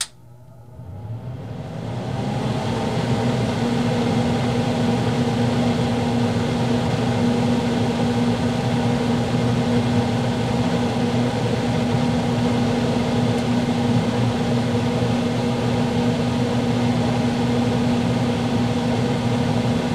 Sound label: vacuum cleaner; E-MU Producer Series Vol. 3 – Hollywood Sound Effects